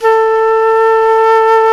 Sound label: Jazz Flute